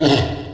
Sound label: ghoul damage